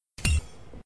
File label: head hit; csgo; FunMod